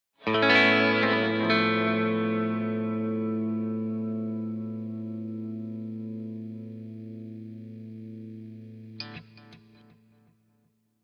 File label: Elect Guitar, electric guitar